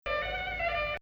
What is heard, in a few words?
Rast Octave, maqam